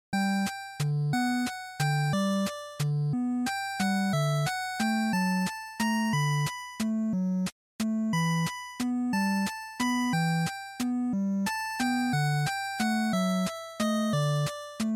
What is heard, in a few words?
NES Action Stage